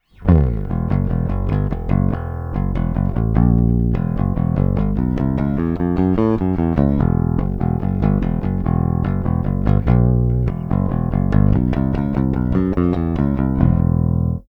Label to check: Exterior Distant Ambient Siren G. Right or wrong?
Wrong